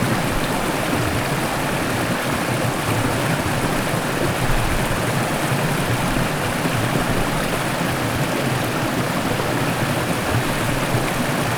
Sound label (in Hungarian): vízesés, patak; visegrad